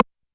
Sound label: Side Stick Ana, YAMAHA RM1X